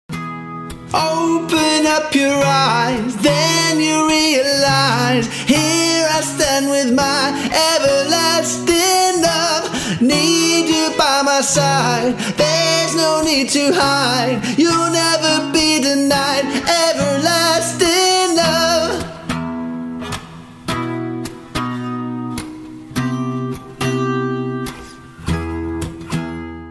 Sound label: Solo Acoustic